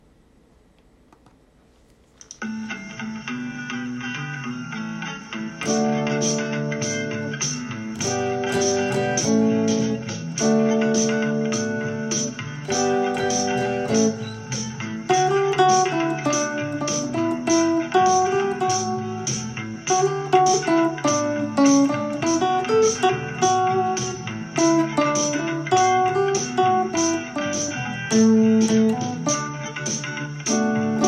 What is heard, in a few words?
Trail Loop; Kahnie